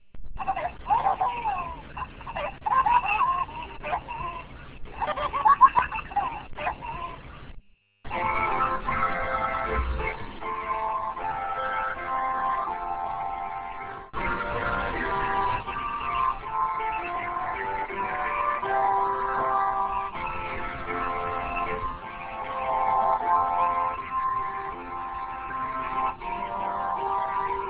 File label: Jingle Bell; Merry Christmas